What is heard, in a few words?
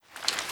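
weapon foley ump45 reload lift; Call of Duty Modern Warfare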